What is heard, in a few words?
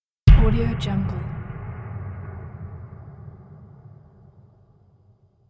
big hit